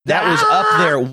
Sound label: gaaaaahhhhhh